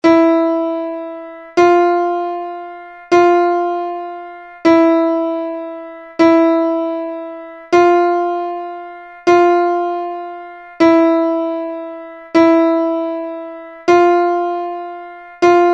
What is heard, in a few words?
Chromatic Trainer A small range, bansuri